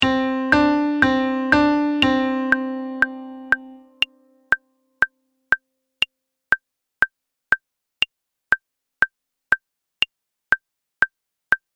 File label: Major 2nd